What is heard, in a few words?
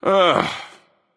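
nonverbal ugh; smite; voicepack; merlin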